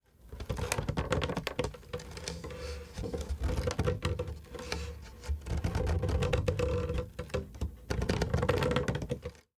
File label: Objects Wood creak